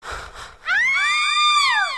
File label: Aaah